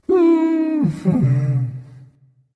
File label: sigh; Smite; cerberus